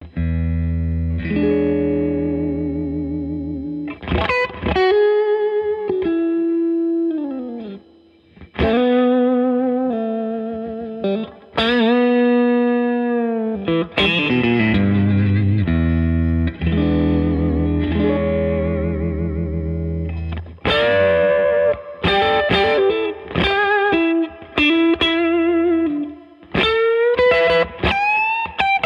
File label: Audio Tracks - MID GAIN STRAT FP LEAD; Jensen BB40; jensentone